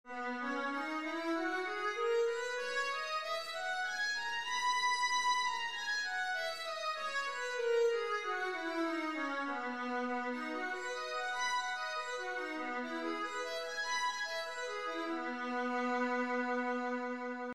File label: viola b major, scales